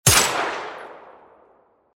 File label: horse shoot